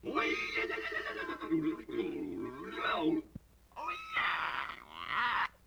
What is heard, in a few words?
LoFi Synthetic Squawk